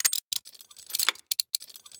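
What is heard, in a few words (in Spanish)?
ROTAR ENGRANAJE CERRADURA, rotating lock gear; yesterday origins gamerip 2016 switch ps4 pc ios macos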